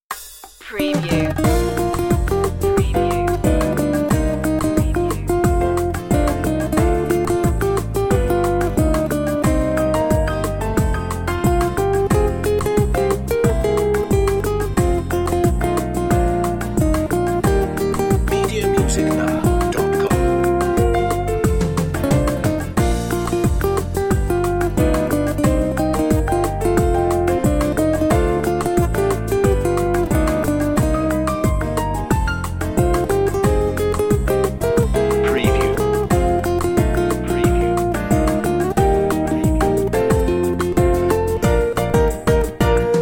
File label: bossa rossa